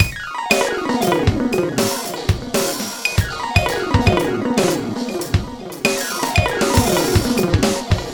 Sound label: Gliss 2fer Full, lounge, 118; Zero-G Kocktail Kollection; Construction Kitz (Lounge); Gliss 2Fers Mixes 118